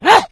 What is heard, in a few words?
become enraged, infected, male